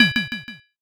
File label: plate set